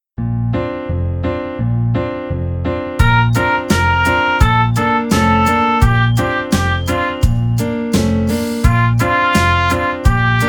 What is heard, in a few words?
Trumpet; easy beat vol 1 trompete